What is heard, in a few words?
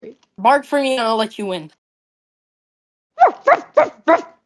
bark